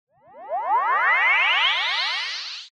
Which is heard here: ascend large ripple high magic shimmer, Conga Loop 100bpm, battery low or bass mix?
ascend large ripple high magic shimmer